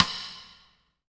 SD RI, SD Rim; Sound & Vision - Gigapack I

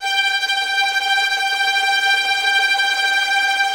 VI TREM F

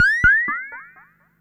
Perc Synth Moog Delay

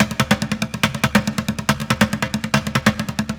Street Groove, Ambient Grooves

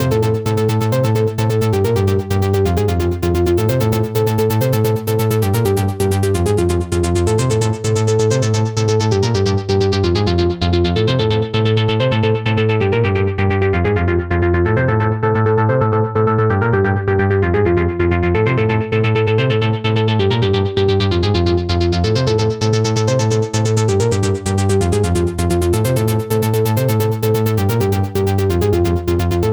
NRG Sweep, Trance

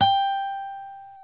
GTR G5, Dual Guitar, Ac 12+; Club-50 - Foundations

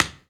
Body Perc

